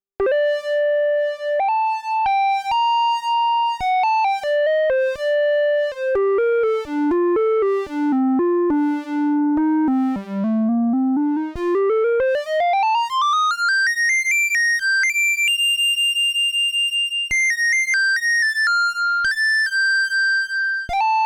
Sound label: Triangle Lead SE80